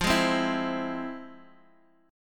F Minor Major 7th, F, Guitar, Half Step Down

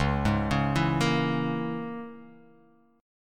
C sharp Minor 6th C sharp

